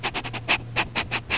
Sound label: DOG SNIFF; ANIMALS